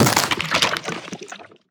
Serpent Egg Death

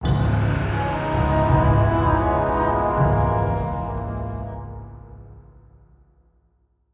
Stinger; Shadow Crafter Scabbs

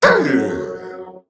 Male Mech, Death

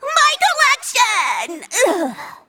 Sporeling Emote Death

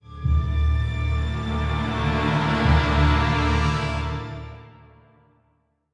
Play Stinger; Flik Skyshiv

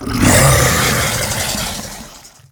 Cyclopian Horror Death

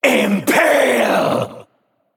Male Naga Attack

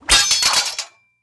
1H Sword Fine Underlay Death

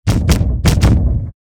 Armored Kodo No Vox Attack Underlay

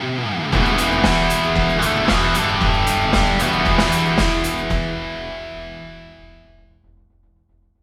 Stinger; RockMasterVoone

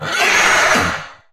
Bonemare Attack

